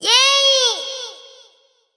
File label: big Win Scream; netent